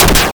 M16 Shooting

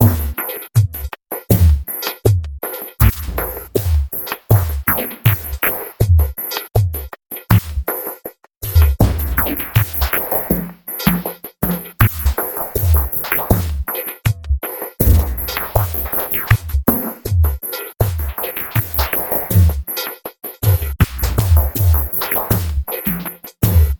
80 Bpm Attack Loop

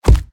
Combat Punch

